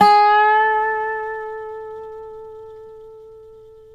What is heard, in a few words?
SLIDE, Dobro Slides